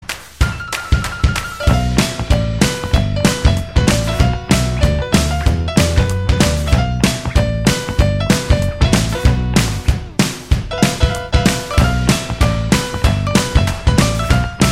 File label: Upbeat Jazz